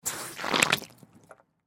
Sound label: Water Garden Hose Faucet Squirt Sloshy; Yard Tools; Big Room Sound